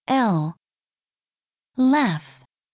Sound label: laugh